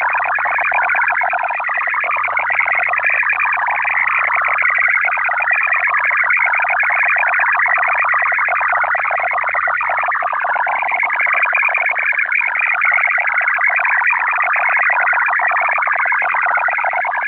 Bulgarian diplomatic duplex 240.18 Bd 8-FSK waveform, BUL DIPLO 8-TONE MFSK ARQ 240.18 Bd; BUL DIPLO 8-tone MFSK ARQ in ISS-mode; MFSK-systems